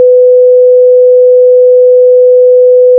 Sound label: sine 500